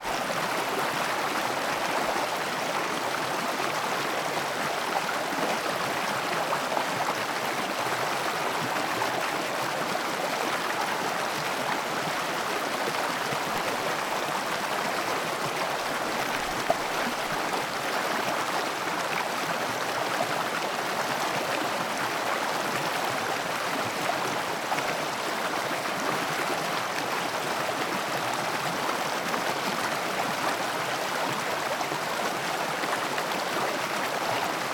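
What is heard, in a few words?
ambience Battle Village; rabbids multiverse; iOS, macOS, Mobile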